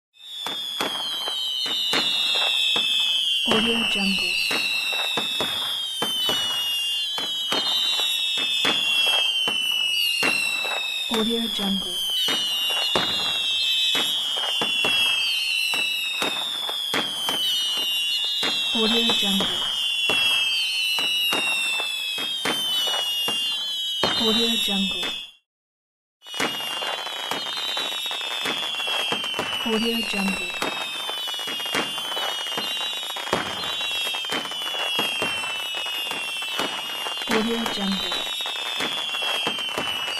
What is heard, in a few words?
fireworks with explosion rocket whistles